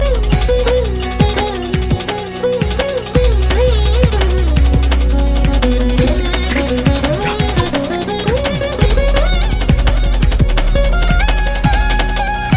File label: classical fast